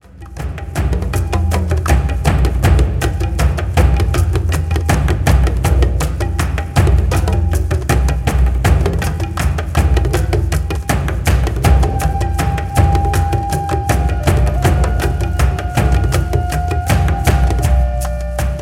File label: relax cargo drum; powermusic